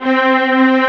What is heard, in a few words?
STRINX DN; CMP - Analogon; HP K-STRINX